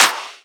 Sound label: Claps; Vengeance - Essential Clubsounds Vol.5